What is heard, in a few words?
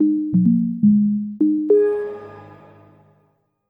xp start; win12